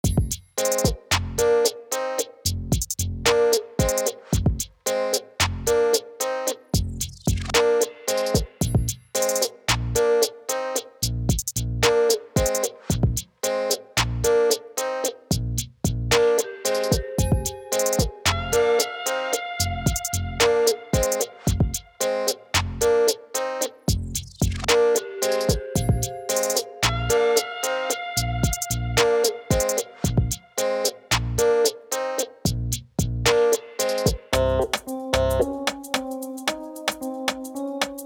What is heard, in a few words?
Bending note; Bright Rhyhhm